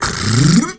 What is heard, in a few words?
MALE SOLO, MALE NOISE, Heart of Africa; Spectrasonics; Heart of Africa 1